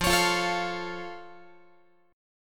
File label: F Augmented F Guitar; strummed